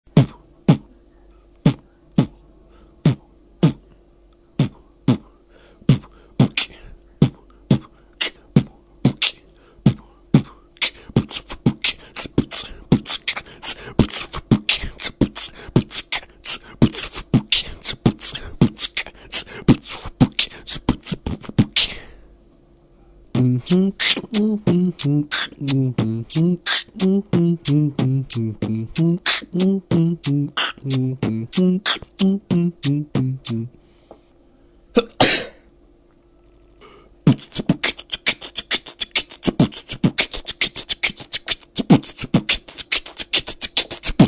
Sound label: beatbox